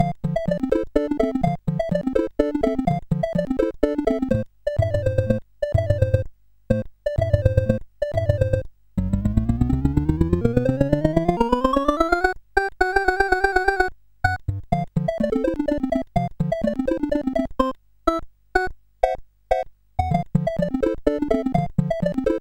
sid CSG8580R5, GAMES; Eruca

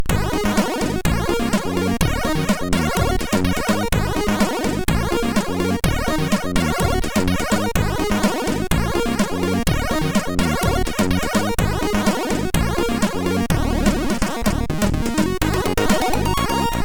Musique, MOS6581R4; Musique 2

